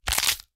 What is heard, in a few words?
bone break; Paradise